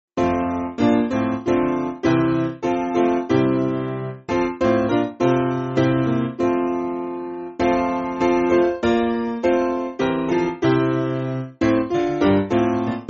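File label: Piano, Free Music Backing Files for Churches; Spirit Divine Attend; Grafenberg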